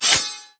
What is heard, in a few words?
Sword basic